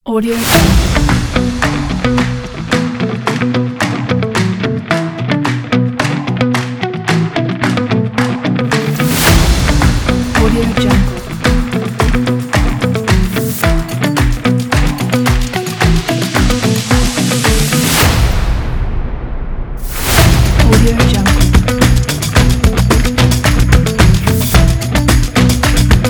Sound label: funk stomp